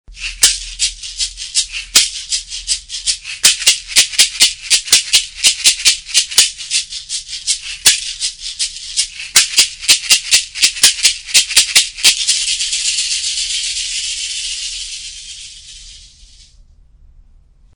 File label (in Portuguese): Caxixi